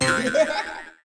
jack in the box

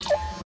dialog confirm

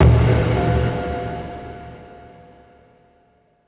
weird hit

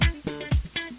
disco head

